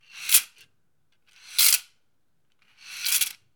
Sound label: Abacus Beads Fast Moving